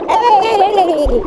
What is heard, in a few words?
drown; quake2; monkey